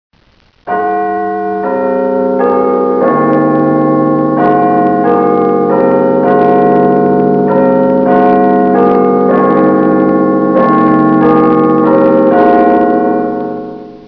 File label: Big Ben chimes